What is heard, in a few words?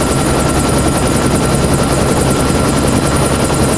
Amb Heli cockpit; Kelly Slater Pro Surfer; macOS, Windows gamerip